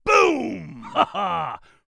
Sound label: open fire; taunt striker